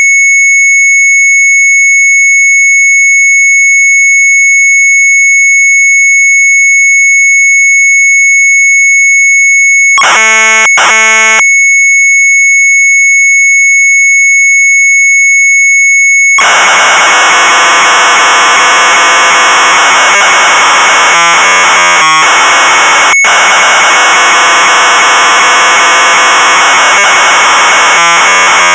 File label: MZ800 Tape, sharp; COMPUTER-PIANO; retromelsarchive